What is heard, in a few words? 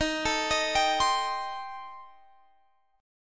D sharp 6th Flat 5th, Guitar, Drop D